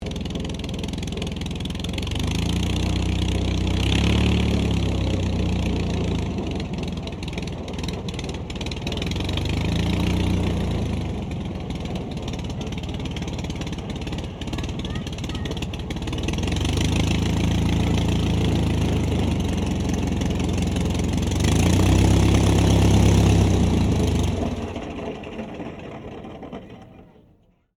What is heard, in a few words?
AIRCRAFT DH82A DEHAVILAND TIGERMOTH TAXI SHUTDOWN; RAAF Tigermoth; Airplanes (Sound Effects:Vehicles)